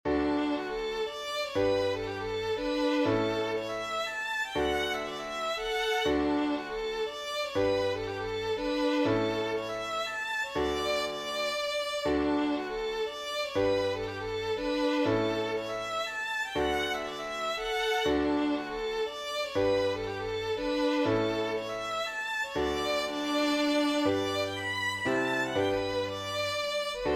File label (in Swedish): polskan